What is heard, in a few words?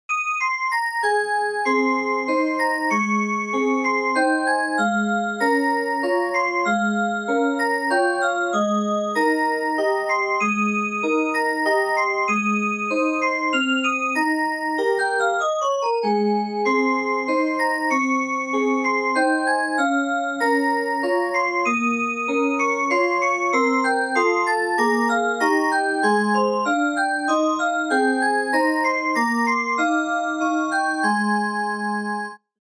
Piano Concerto No.1